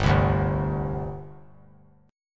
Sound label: G Suspended 4th; Gsus4 strummed; JGuitar